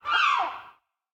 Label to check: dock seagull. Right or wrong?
Right